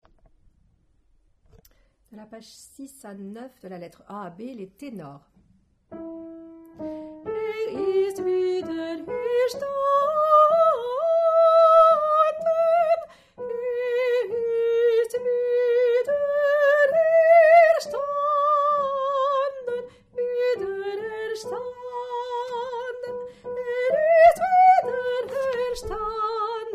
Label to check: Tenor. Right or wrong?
Right